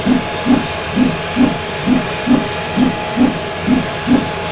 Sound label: rotor; svencoop; hunger; thambs